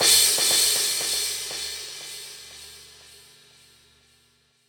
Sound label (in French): cymbale inversee